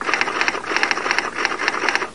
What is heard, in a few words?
Typing On Old Typewriter